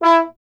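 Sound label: Bass Trombone; Miroslav Vitous - Solo Instruments VOL-1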